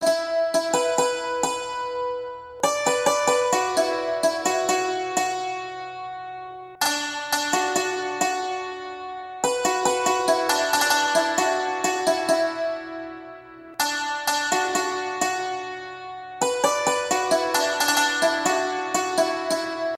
Very Lovely guitar

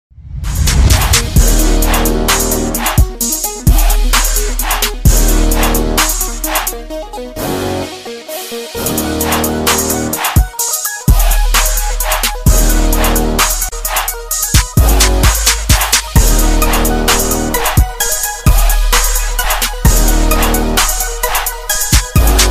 Ghetto Hip Hop

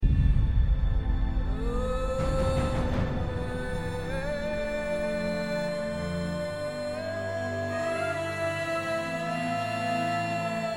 Indian Song Sms

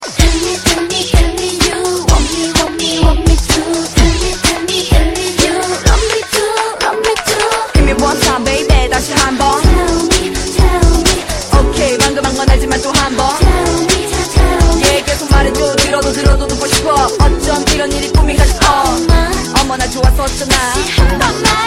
Tell Me Rap

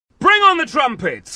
Trumpets

